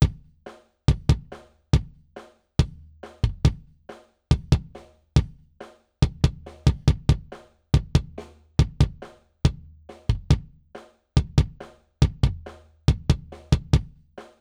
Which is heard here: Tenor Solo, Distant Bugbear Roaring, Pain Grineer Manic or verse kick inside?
verse kick inside